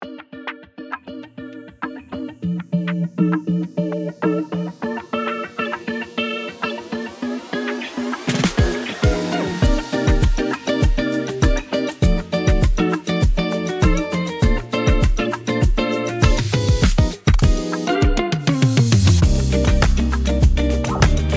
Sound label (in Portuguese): Bossa Nova; Netflix